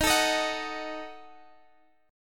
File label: Eb Diminished, Guitar Half Step Down